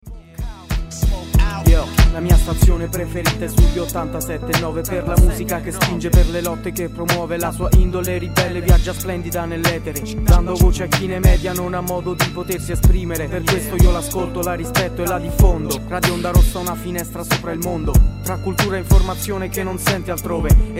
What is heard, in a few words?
Jingle Hip hop spot